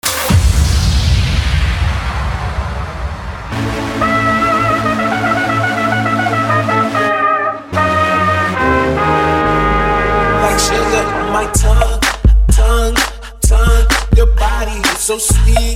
Mashup Bass house

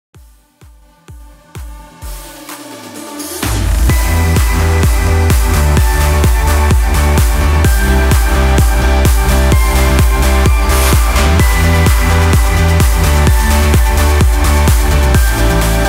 Progressive Cut 128 BPM

